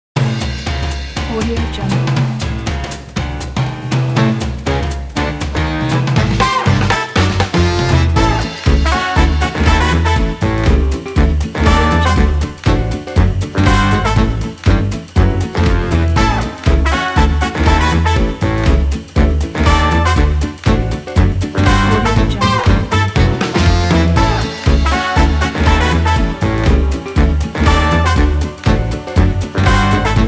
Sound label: jazz band swing